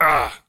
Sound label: hurt; rogue savage rats